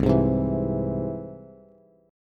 C Augmented, Bass, chord; strummed; JGuitar

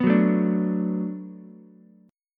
D 6th D down Ukulele, chord; D6 strummed; JGuitar

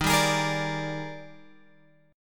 D sharp Major 11th D sharp, chord; D#M11 Chord {11 10 X 13 11 10} strummed

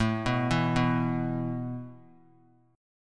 A Suspended 2nd A; Asus2 arpeggiated

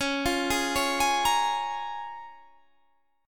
C sharp 6th

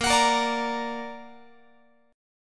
A sharp Augmented Guitar; strummed; tuning 4A,4E,5A,5D,5G#,6C#

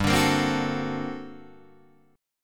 G Minor Major 11th, G, Guitar, Drop D

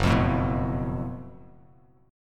Gb Minor Major 7th Double Flat 5th, Bass Standard; strummed; 2,8,7,4

